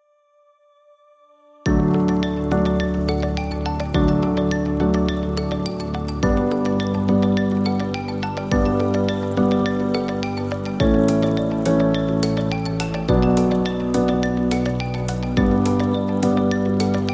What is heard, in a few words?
Ambient Documentary; Modern